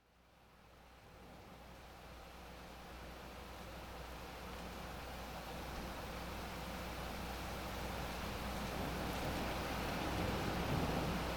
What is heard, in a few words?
thunderstorm; preston st